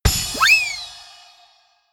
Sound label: notification; samsung Aleart